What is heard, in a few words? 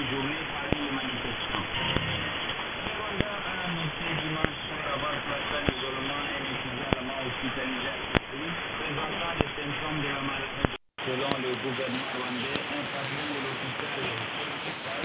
15570khz AM; Radio Vatican